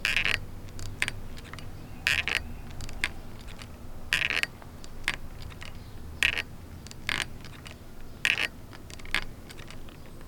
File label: Creaky Swingset